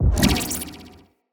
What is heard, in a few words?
Throwable Coolant Item Aim; Ambience; Venus Open World